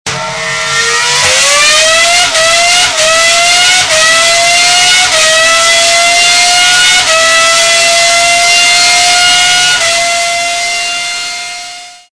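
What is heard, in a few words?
formula 1 engine sound of a racing car; zvuk-dvigatelya-gonochnogo-bolida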